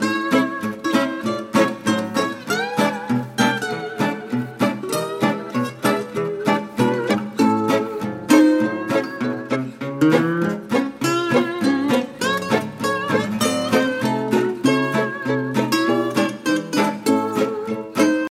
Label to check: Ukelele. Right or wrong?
Right